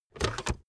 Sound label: car backseat table fold in fast